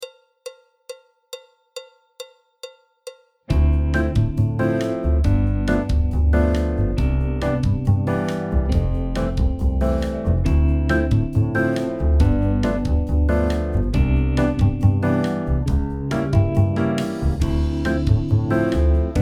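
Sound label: backing track, Jazz; alto, flute, trombone, piano; Jazz Syllabus Level 1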